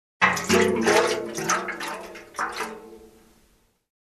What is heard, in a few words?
Liquid Gas in metal tank